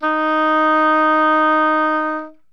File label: oboe; Winds